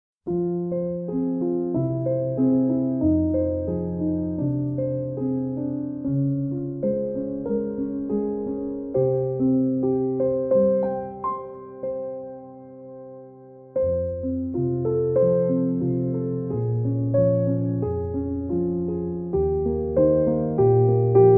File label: Instrumental Piano